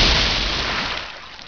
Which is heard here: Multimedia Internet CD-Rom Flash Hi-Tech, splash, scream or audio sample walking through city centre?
splash